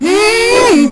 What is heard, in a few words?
Hmmmough